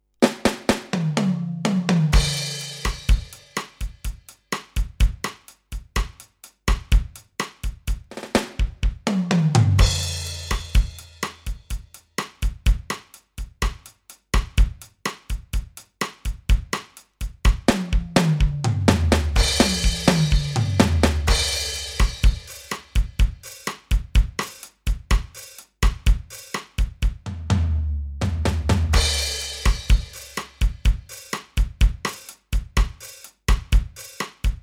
Brazil Bossa

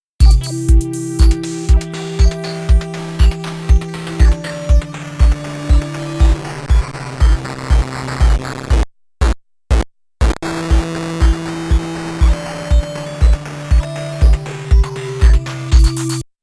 Crusher

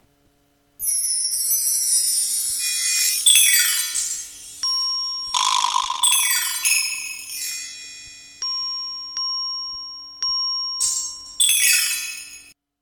Millenium Nona Pad Windbell Kit

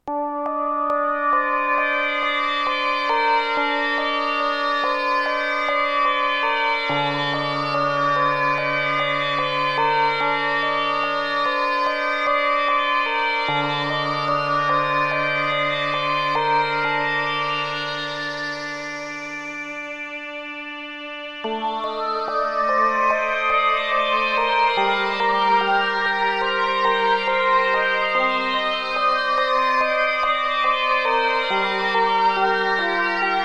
Polysix Poly

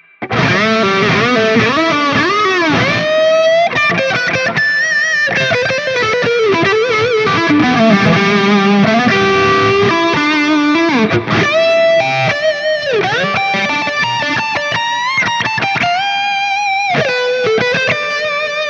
Juicy Drive; Harley Benton DNAfx GiT Pro

